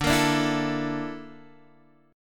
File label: Bb Major 7th Flat 5th